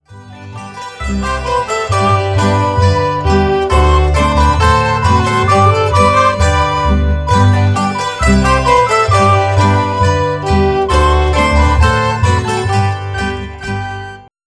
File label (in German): hackbrett